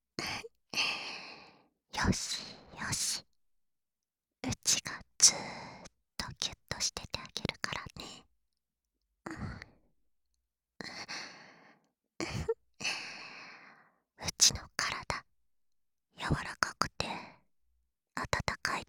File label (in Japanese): セリフ導入のみ+吐息＆寝息（囁き強化ver）; オマケ・吐息寝息トラック